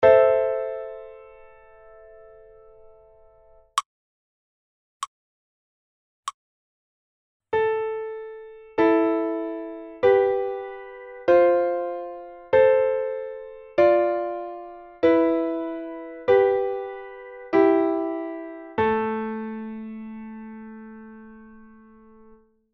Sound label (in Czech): Dvojhlasa melodie ACE FAST